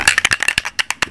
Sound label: spraypaint shake; tuxpaint; magic